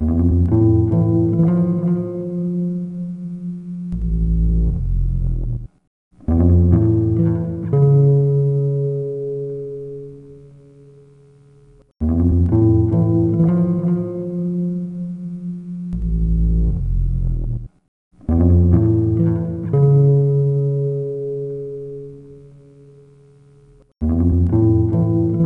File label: Bass; Despiria; Dreamcast